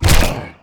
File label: smack; Barotrauma; Subsurface